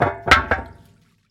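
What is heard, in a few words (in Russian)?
громкий яркий звук упавшего канализационного люка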